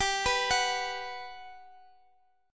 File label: B 5th G; B5/G arpeggiated; chord shape; JGuitar